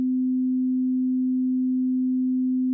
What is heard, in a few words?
sine 256; timbre